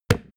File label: wood hit hand